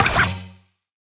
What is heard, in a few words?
shield reflect